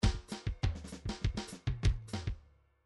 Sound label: bdf midi file grooves latin latin a